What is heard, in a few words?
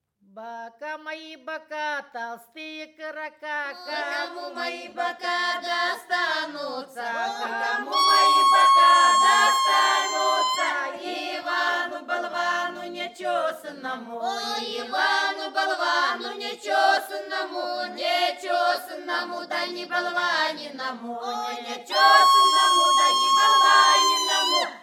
Satirical Song Womens Chorus